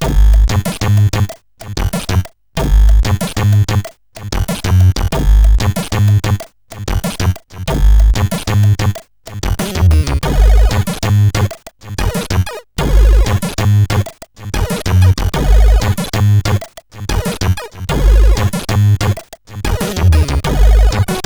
disco intro, CSG8580R5

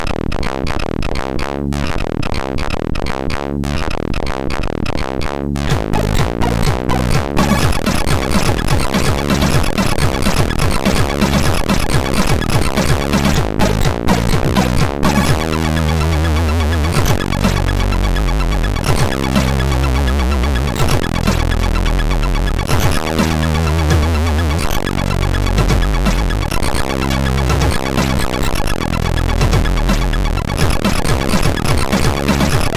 Finnish tune, sid MOS6581R4; hvsc

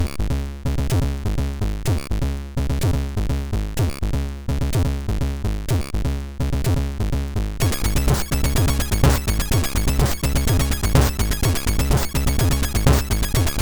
Frogsystem sid MOS6581R2

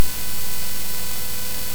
sid CSG8580R5, GAMES; Dragons of Flame

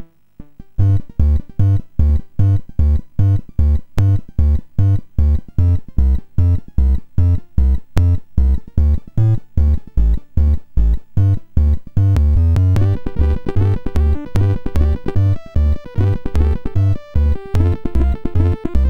Hillbilly High on Banjo, sid MOS6581R4

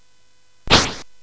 Dark Castle, CSG8580R5

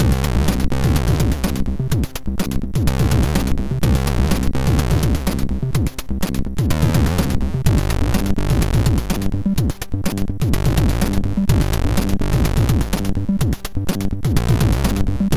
Loader Music, MOS6581R4

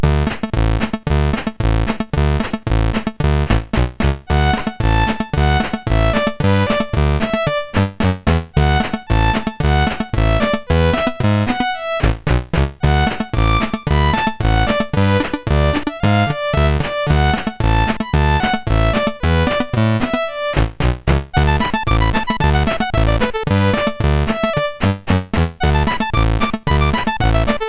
Country, MOS6581R4

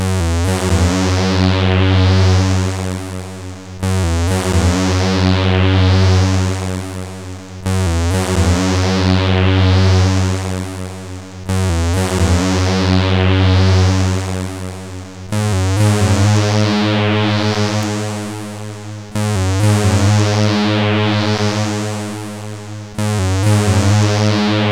Big Echo, sid CSG8580R5

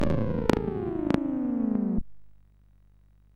tape version MOS6581R4; sid

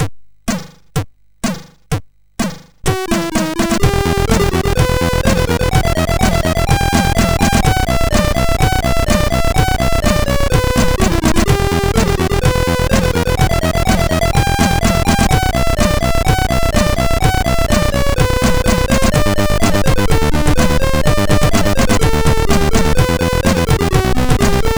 Techno, MOS6581R4; Senfonian

